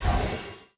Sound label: ui